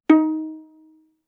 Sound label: Violin pizz ff sul G Eb4; Strings